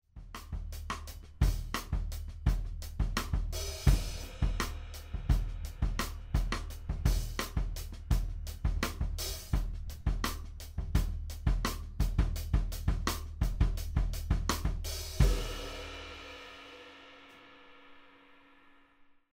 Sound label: big band groove rimclick, drums